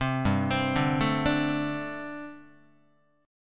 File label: G Suspended 2nd Suspended 4th, Guitar; Gsus2sus4 arpeggiated; JGuitar